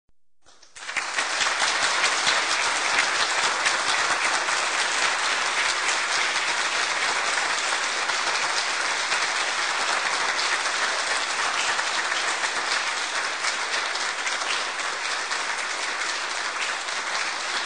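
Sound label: Applause 80-100 people; Aplodismenty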